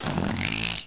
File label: pig